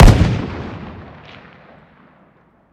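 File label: weapon lmg fire player atmo ext; gmod; viper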